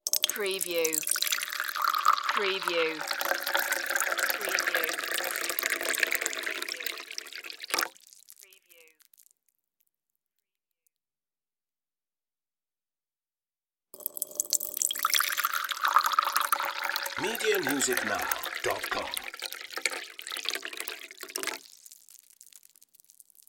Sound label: tea pot pouring